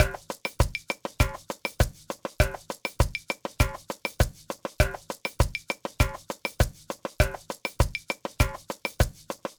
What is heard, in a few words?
Ensemble, Latin Percussion